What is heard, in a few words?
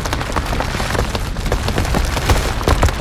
inferno bomb; Ziggs; snowday